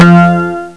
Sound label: Bonus; ZOA